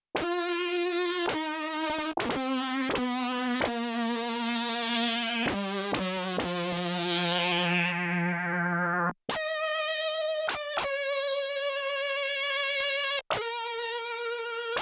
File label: Lead delay